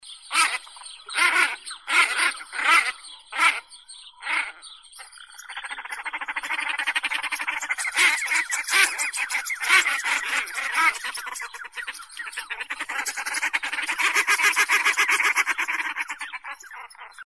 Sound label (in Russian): zvuki utok